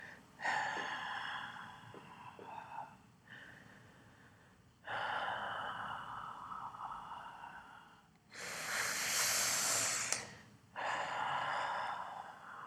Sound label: breathe